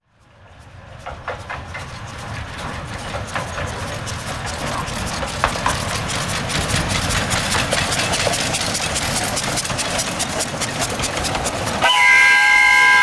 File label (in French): trains a vapeur a voie etroite; sonotheque